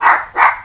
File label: dog bark